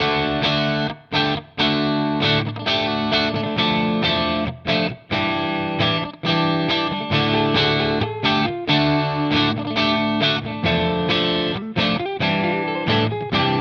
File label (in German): Jackson E Gitarre Mid Crunch; Gus G Signature